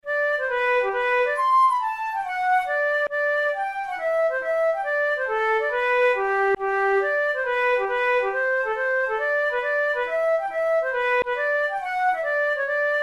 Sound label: blue eyed molly, flute